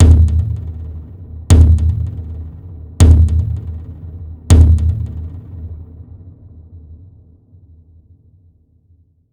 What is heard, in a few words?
drum seq; svencoop; grime